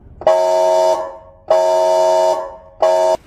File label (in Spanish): sonido ambulancia